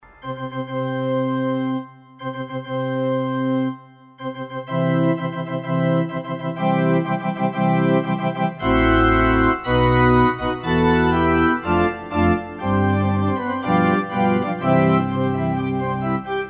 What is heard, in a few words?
Wedding March